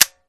M4 Dryfire; zps; Weapons; Firearms

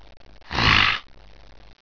pharaoh pain; svencoop

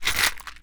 Ammo Pickup; zps

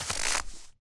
snow footsteps; zps; Foley

